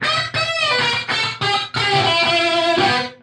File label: straight up guitar lick